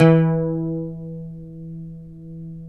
PLK OUD E; Roland - LA Composer vol. 3

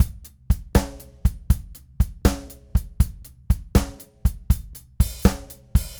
Rocky Mountain Groove, Drums

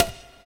FX DRM, Analog; Sound & Vision - Gigapack II

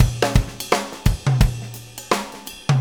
Jim, Drum Tools, Loops; Sonic Foundry (Sony Creative Software); Loops & Samples Drum Tools

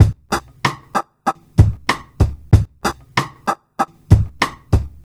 R&B Drums

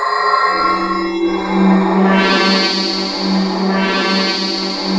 HORROR, SYNTH; East West - Global Sounds

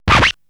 prc g#2, Procussion Mix; Retro Sampler; EMU E-III

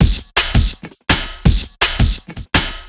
Beat, Futurist Drum & Bass; Drums & Percussion

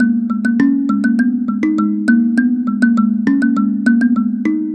Mbira Low; Melodic; Ambient-Melodics-II

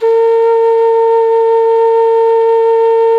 QUE A 4B, quena; SoundBytes - Early Patches

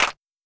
Simmons Clap

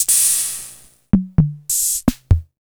Soundmaster Stix ST-305

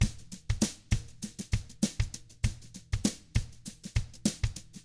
FUNKY, Drums

